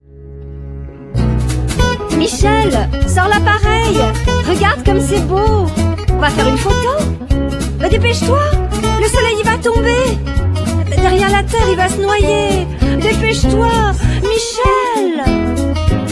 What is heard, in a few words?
french